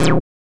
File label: SNTH BASS ELCTR 140 A, Techno